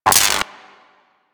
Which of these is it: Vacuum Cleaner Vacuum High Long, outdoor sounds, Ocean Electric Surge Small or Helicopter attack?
Ocean Electric Surge Small